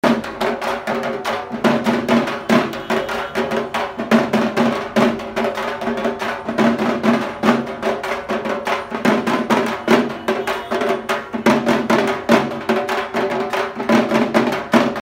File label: durga puja dhak music dhak drums music kolkata beats india